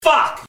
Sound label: button